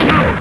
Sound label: TANK SHT; THEXDER